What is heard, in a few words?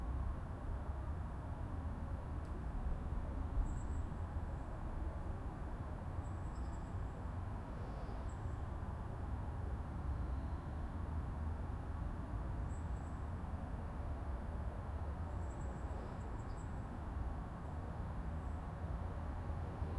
park